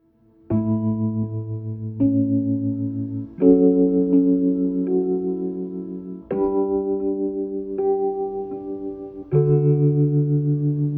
Electric Piano Duets

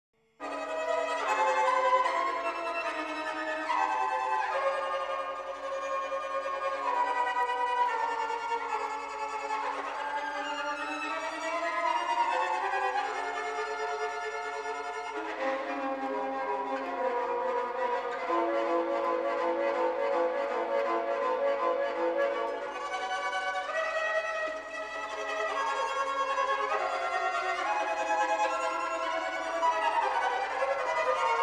10-String Double Violin

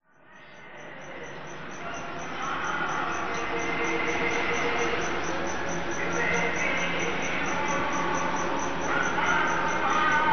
Small Animals Temple Sounds Basic Noise In Bundi; Field Recordings From India Nepal

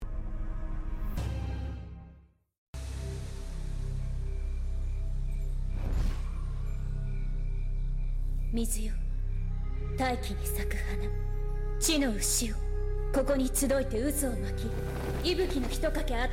Core Noisetone

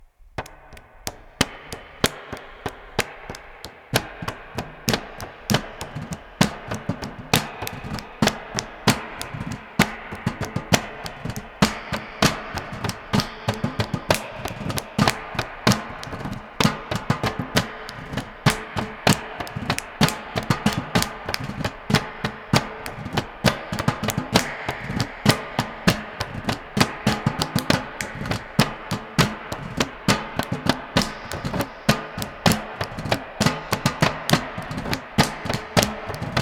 percussive plains

